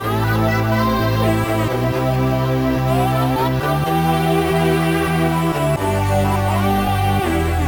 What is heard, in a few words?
phaser